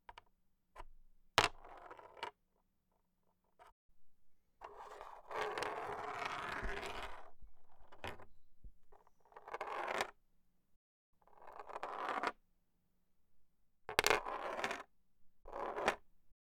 Chess Pieces Rolling On The Chess Board

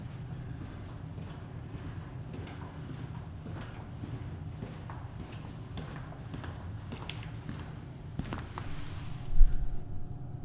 Walk Basement

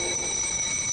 1950 S Bank Alarm Bell

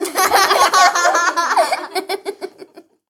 Funny Kids

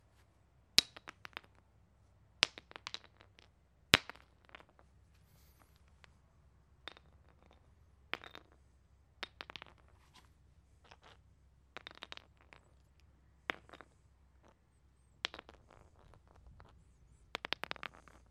Rolling Stones

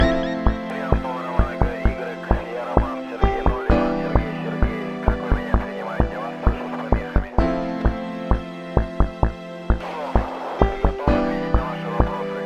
Upbeat Japanese Gaming Music

